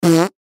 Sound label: Human Fart Large; Blastwave